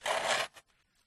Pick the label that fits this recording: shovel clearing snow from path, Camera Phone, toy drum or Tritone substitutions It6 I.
shovel clearing snow from path